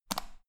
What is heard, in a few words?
power button turn on off hard fast